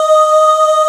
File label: Oohs D#4; Proteus 2000; Digital Sound Factory Proteus 2000